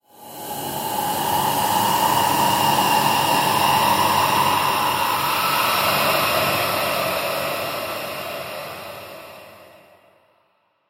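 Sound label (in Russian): звук ужасов на хэллоуин полтергейст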